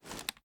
sm uzulu reload empty xmag fast rattle; reloads; iw8; gmod

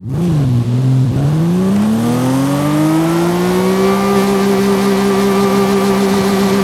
first, vehicles; 911s

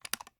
sm uzulu reload xxmag fast rattle; gmod; iw8

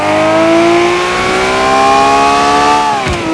second, vehicles; gmod; tdmcars; murcielago

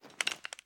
reload empty up; reloads; gmod

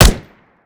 weap famas fire, weapons; gmod; viper

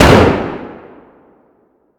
shotgun fire atmo int; gmod; viper

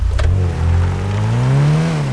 first, vehicles; gmod; tdmcars; gtav; pol sedan

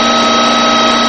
supercharger; gmod; tdmcars

